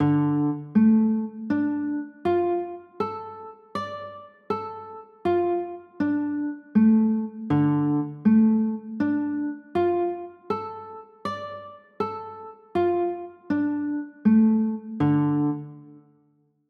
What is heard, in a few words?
d m, chords